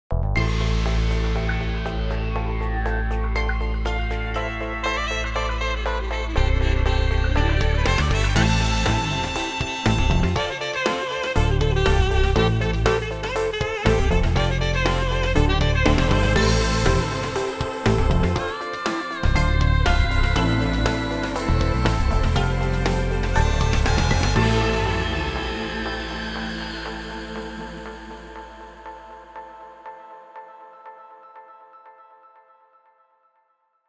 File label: sax tune